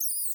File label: insect chirp vocalization; sound effects one